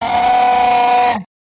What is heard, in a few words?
Large Servo Motor